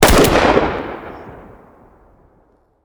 pistol shoot

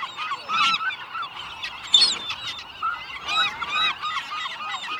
seagull chirp

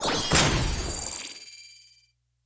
mag end

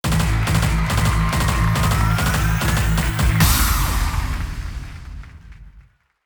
rock

